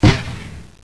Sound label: snow mortar